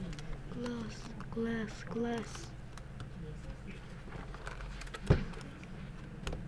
glass

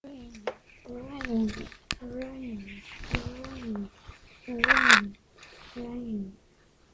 rain

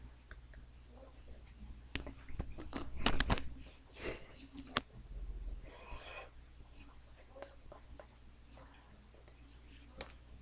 stab

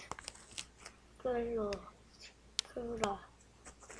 flash

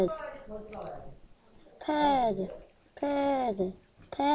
pad

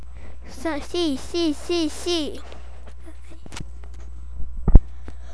sea

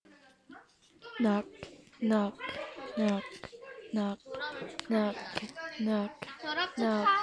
knock

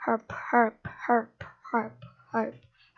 harp

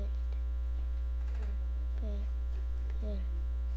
bell

